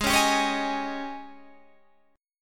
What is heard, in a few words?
G sharp Major 7th Suspended 4th, Guitar, Half Step Down